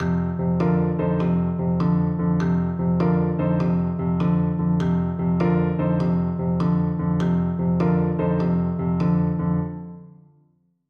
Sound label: Blues Piano